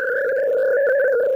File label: FM FX, synth; AKAI S-Series CD-ROM Sound Library VOL-6